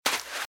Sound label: Footsteps - Sand - Dry - Run - Left; RadwinIsland